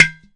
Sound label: ROLAND SPD-20 PERC TABLA; ETHNIC and WORLD PERCUSSION